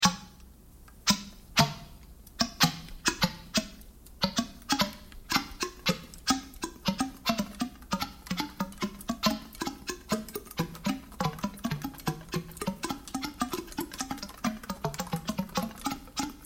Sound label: thunk noise